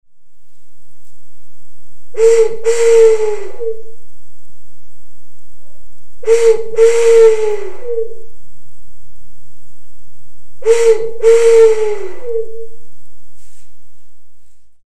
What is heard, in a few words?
owl whistle